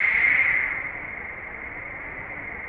WINTER WIND; Pro-Rec - Synthsonic; JV FILM

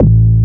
RV BASS

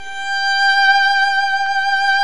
VIOL G4, Roland LA Composer strings; Roland - LA Composer vol. 1

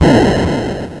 Atari Noise Long, Atari 2600; 8-Bit; Chiptune; FX

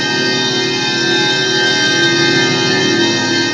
D#3, TURBOBELLS; Northstar - The Wizard VOL-1

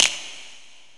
SNAP; Best Service; Dance Mega Drums; SNAPS

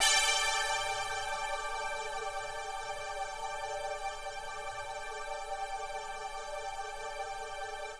120 Pad E, Keys, Nu Jazz House; PowerFX

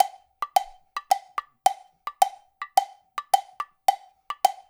LOOP L, Percussion; InVision Interactive; Percussion Slam; PROGFROG

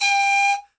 PIPE G 5, REED PIPE; Africa; Spectrasonics; Heart of Africa 1

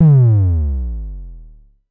DSM2 Sub; MPC DSM-2

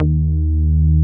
SH 101 BASS

